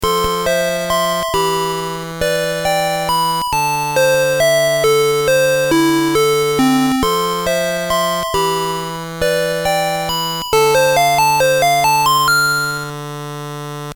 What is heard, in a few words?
Game Over; Solar Striker